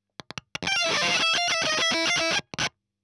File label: EL Fuzz End